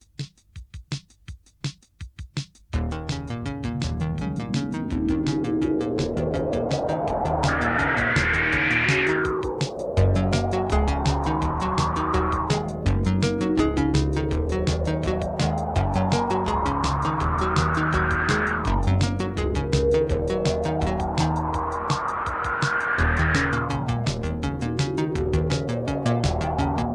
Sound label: Synth Pop Bass; tape